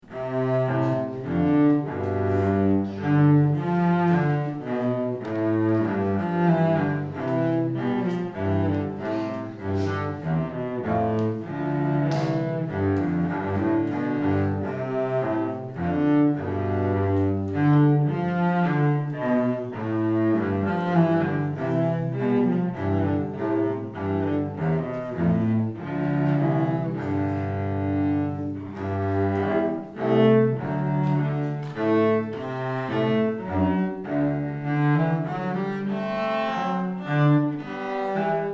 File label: Menuet, cello